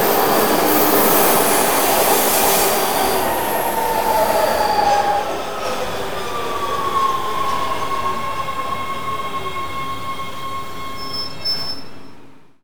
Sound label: stop, trains